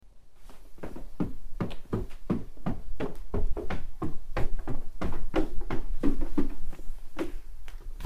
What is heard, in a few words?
Stair Footsteps; horror; horror sfx vol 2